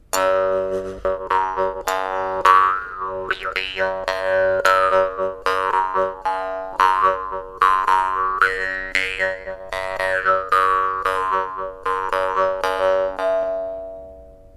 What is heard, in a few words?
mor4ong bird